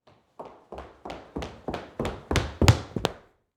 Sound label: Foley Footstep Cowboy Boots Running Toward On Tile; Foley Footsteps